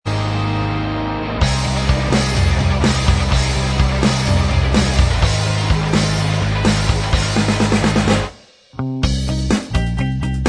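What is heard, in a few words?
instrumental, backing track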